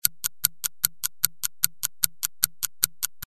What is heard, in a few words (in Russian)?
chasi